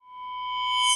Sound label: Champagne Flute Reversed Ramped